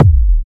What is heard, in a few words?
fluffy kickdrum e key, bass drum single hit tuned to the E note; kick drum samples